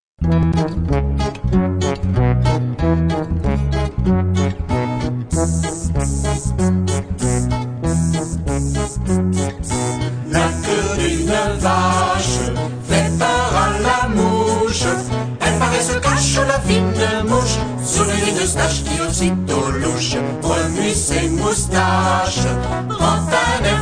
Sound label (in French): la vache et la mouche chant